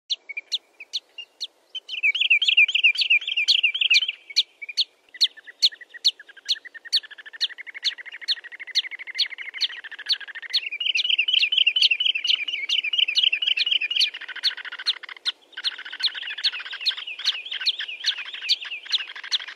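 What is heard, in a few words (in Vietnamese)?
tiếng chim đế hồi choắt biển ngực trắng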